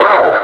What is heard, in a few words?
pain, suppressor